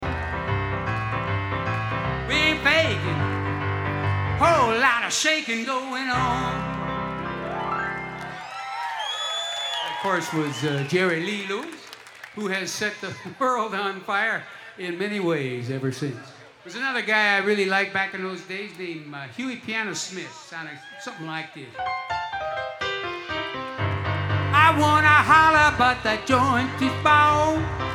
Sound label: Piano Moment